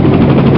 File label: flip; Strategy Companion; guess